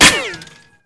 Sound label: metal; Mafie 1